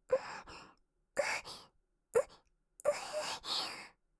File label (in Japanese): 乳首舐められ; お金持ち清楚ロリっ娘ママ; カスタマイズ用音源